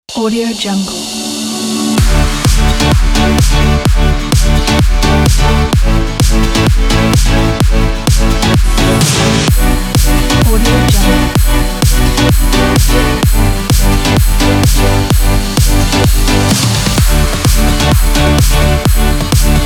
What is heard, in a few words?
spring pop